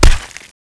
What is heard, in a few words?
bomb drop; Boss Megalodon